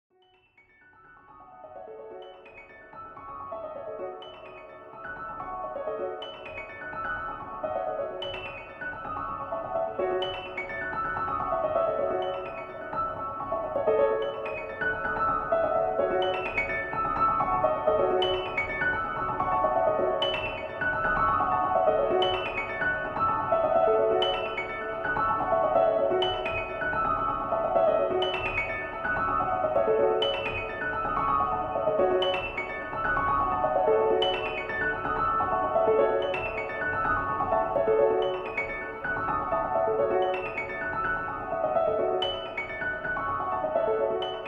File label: Cinematic Piano Shimmer Soundscape